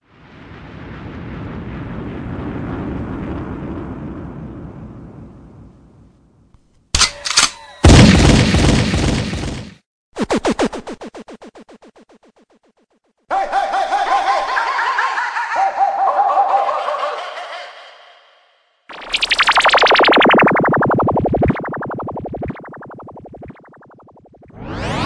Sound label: JINGLE SAMPLES